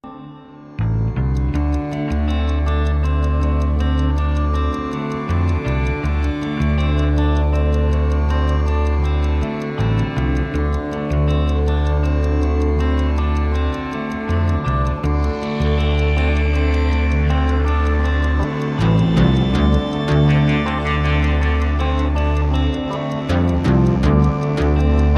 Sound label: Ambient